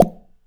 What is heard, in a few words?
drop